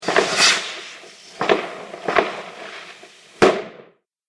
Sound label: Fireworks Rocket Launch And Bangs; Firework Sound Effect; Celebration, Party, Holidays